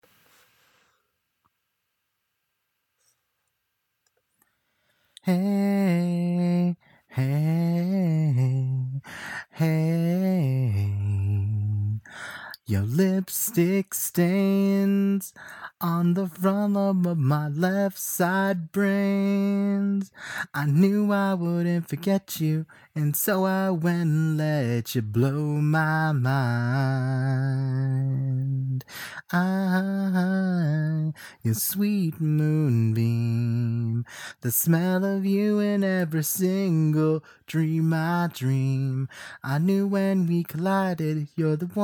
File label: Song Acapella No Instruments